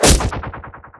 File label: glaives impact; weapons; dota; silencer